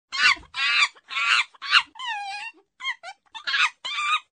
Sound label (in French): chimpanze